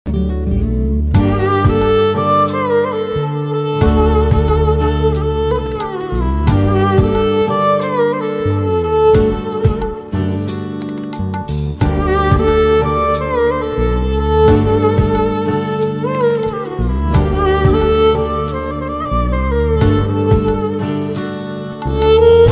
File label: instrumental ringtone sad shayari background music ringtone